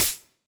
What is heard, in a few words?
Tap Great; bansonic; BANSONIC SFX